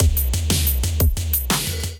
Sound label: Harbour Kit, Drums; Ableton; Drive and Glow